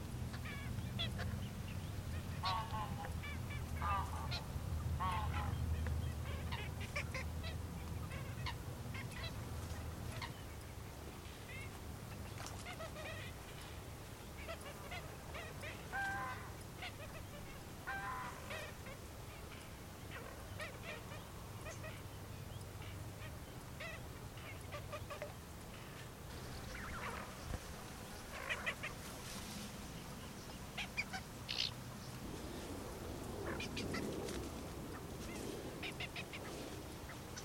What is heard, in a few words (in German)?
Zwergdommel; Mohrweihergebiet ERH